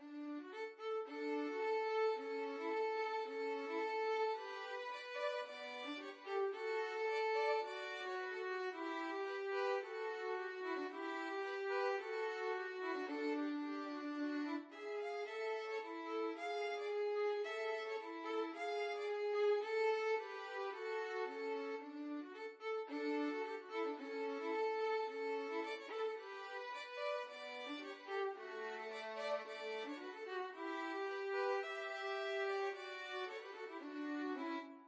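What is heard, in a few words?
schubert symphony, violin duet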